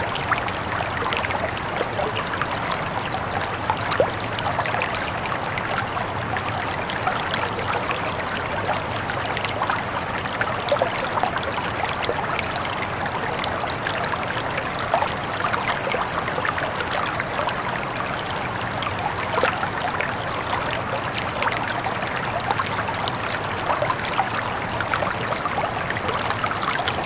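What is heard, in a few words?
noise of falling water in forest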